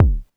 polyvox bassdrum, Drum Machines, Formanta Radio Plant Polivoks